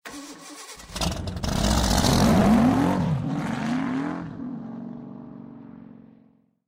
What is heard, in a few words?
square go; RS Games Sounds; Monopoly